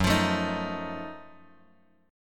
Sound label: F sharp Minor Major 13th down, Bass Standard; strummed